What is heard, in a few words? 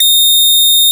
Sax D6